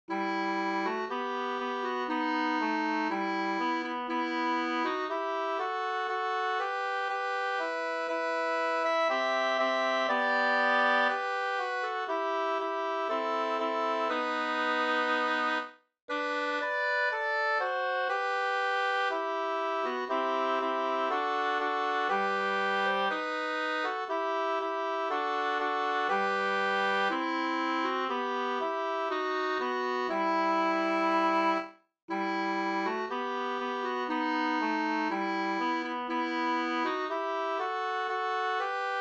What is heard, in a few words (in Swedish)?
vismelodi; fr Vingakerstrakten